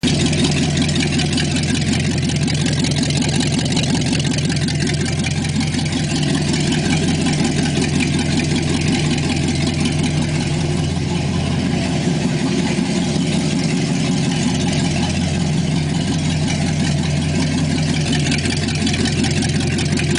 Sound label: v8 exhaust